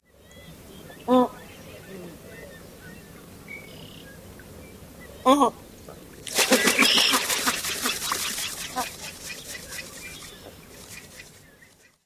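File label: calls then flight, White Ibis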